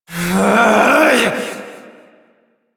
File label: Frustration; Warframe; Entrati; Evil Twin